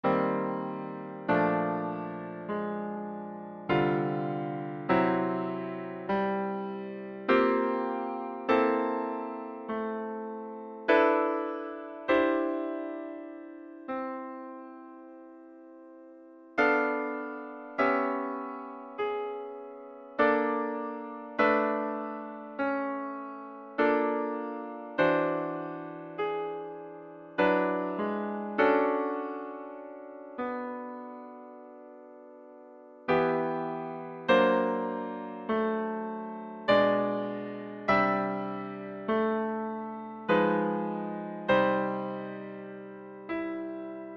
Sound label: satie sports choral, piano